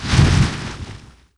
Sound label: symbol trans wild